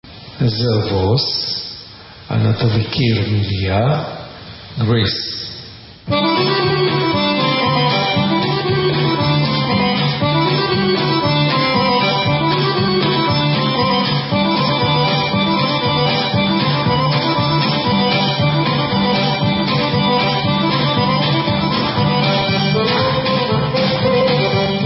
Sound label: Zervos Greek Instrumental